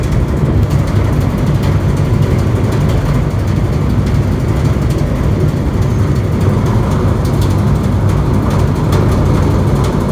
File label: ferry bangin